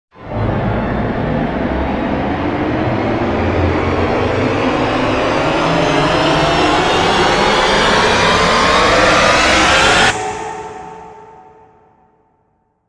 creepy crawly

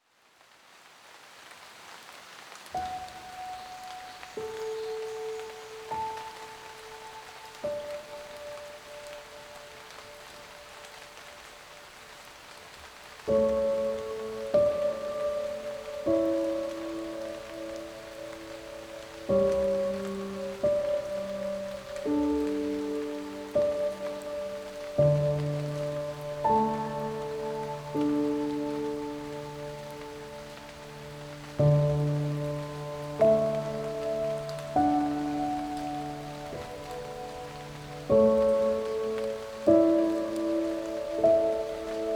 piano, sleep with forest rain sounds

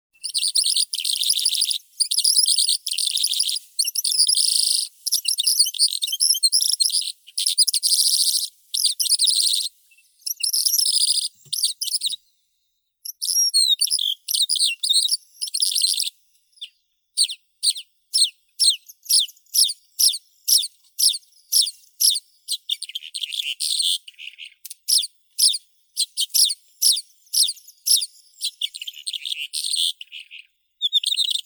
zhavoronok stepnoi melanocorypha calandra; zvuki prirodi

